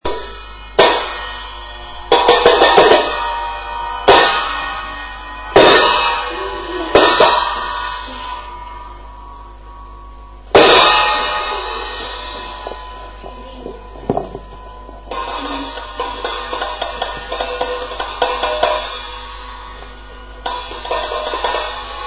piatti; CHE SUONO HANNO; e il buon augurio come i botti a Capodanno?

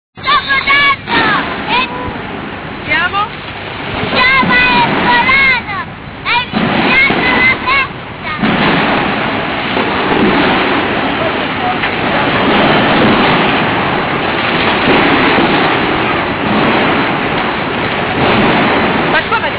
botti, rumori, inverno

